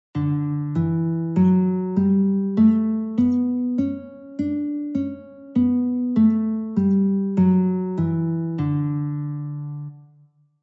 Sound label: D Scale Guitar; California Music Conservatory